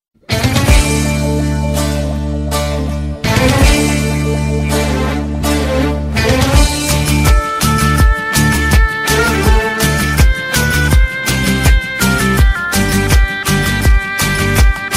guitar mix ringtone; samsung

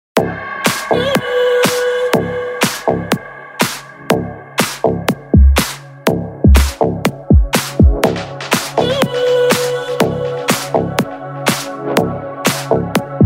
dubstep iphone ringtone

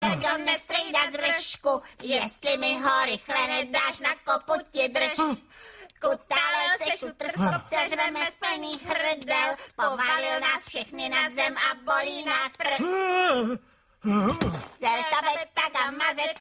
srilankan ringtone

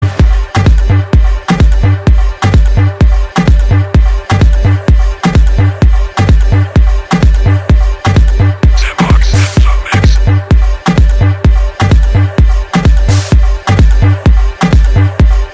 morocco house english ringtone

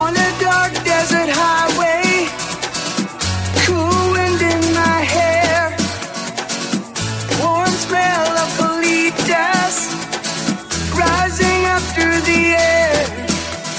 sad, ringtone; califonia

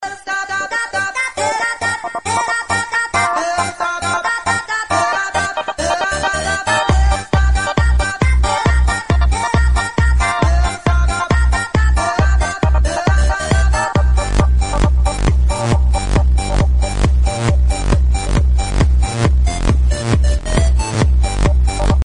electro funny ringtone

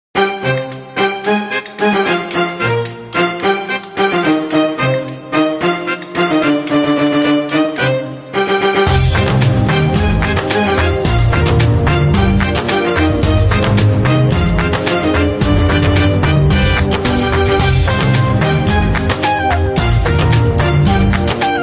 nokia victory ringtone

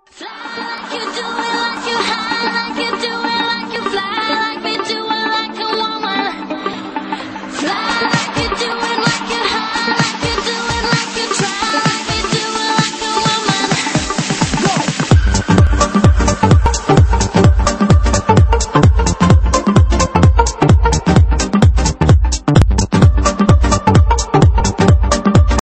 ringtone

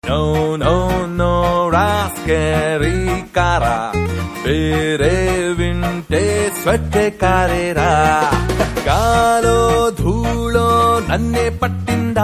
telugu crazy car tone

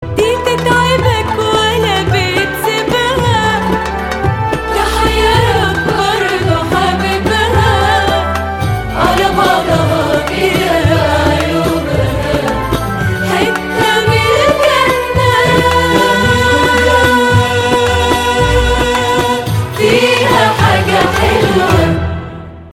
arabic ringtone

